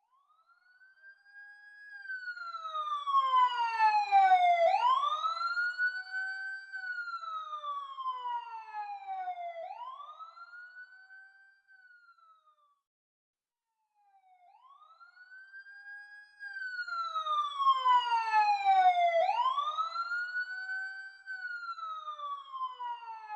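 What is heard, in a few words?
police siren doppler by slow, exterior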